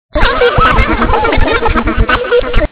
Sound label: computer talk